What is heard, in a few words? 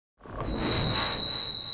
light ball throw; dogma